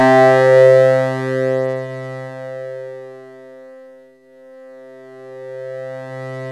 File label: SINN LEAD, MORPHEUS; Syntec; Wall of Sounds